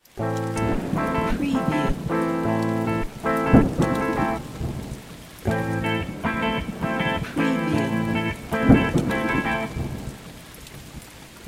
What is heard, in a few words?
RTM Bastaki Am 105BPM, Guitar, electric; ریتم بستکی 4 گیتار الکتریک